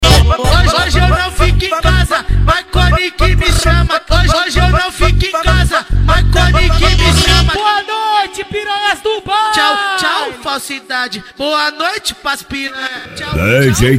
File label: funk